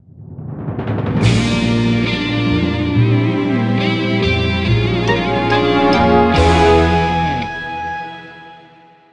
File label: Bari Sax